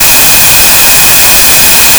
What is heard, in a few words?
fm cello single; FFTimbre